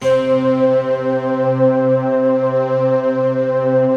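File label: harpsichord, slow harpsiflute; Optical Media International; Sonic Images Library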